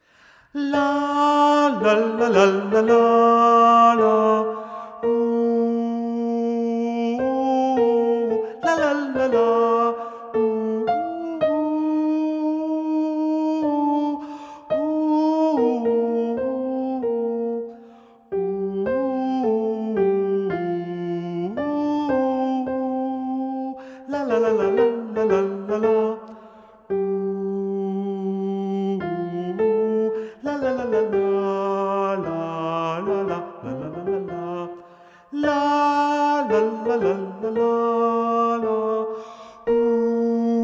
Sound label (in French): SOPRANO